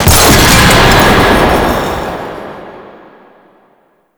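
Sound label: Space Trench Run Power Cell Explosion; Warframe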